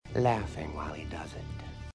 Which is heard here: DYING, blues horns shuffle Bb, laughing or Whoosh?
laughing